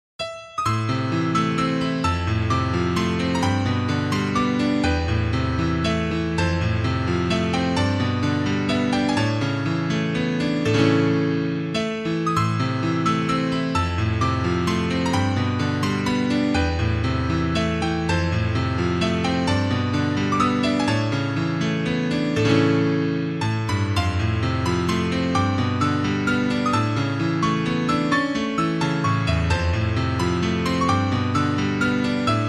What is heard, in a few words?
piano; soleil-musique; Fluid R3